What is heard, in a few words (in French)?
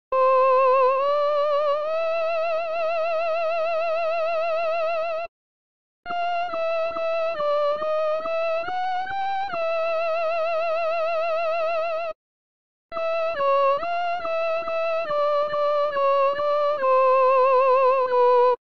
soprane haut